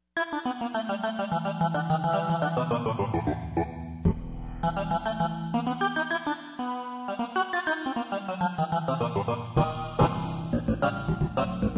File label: Scatter, Yamaha TG55